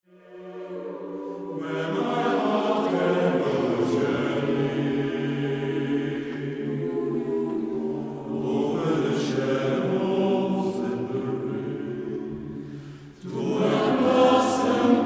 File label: ssattb